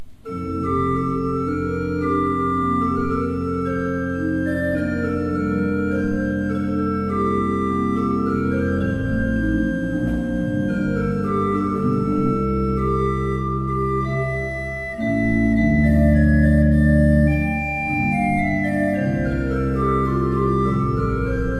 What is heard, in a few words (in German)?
Fuehrer, gebrauchtorgel